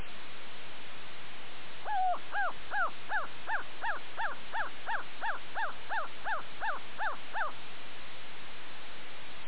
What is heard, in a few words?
crow bark